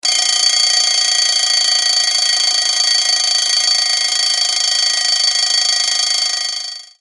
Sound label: Alarm Clock Retro